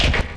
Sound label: paddel